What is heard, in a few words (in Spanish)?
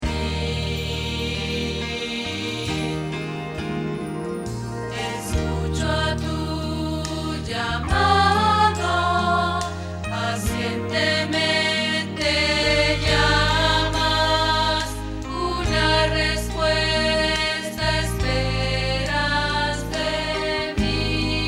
Contralto